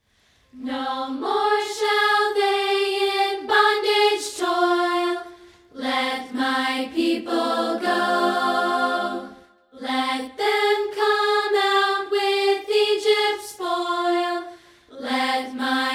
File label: Go Down Moses A Cappella